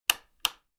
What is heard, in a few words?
switch